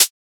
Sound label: Closed Hihat, Techno; Drums; Drum One-Shots; Ghosthack; Free Techno Drum Shots 2024